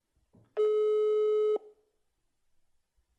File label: beep Call; softphone; crm